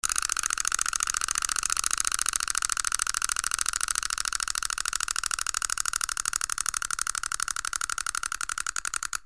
TOYS

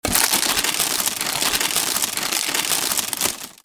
PAPER

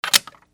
HOUSE

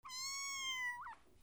ANIMALS

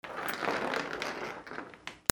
FRICTION

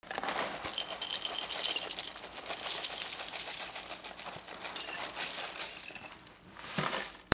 KITCHEN

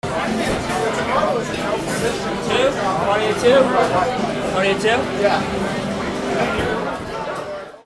AMBIENCE

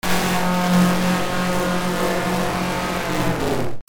SCIFI

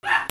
DOGS

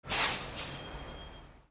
VEHICLES

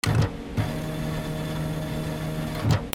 OFFICE

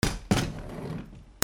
FALLING

